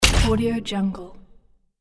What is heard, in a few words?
bad click; jbobstudio